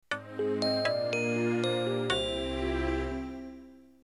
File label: shut down; Plus GSM; Poland